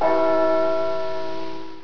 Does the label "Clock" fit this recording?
Yes